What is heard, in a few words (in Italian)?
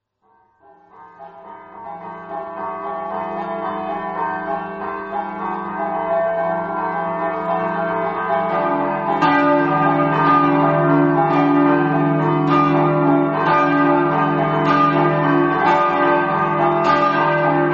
campane